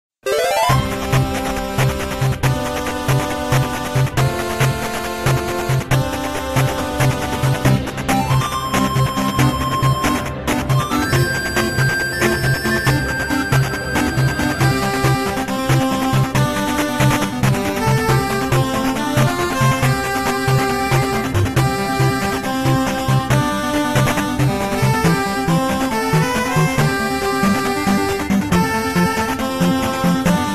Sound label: Fossil Falls (8-Bit); Super Mario Odyssey